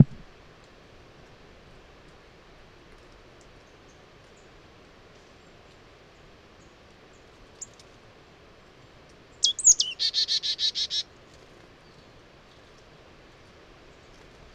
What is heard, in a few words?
marsh tit call; Benjamin Allegrini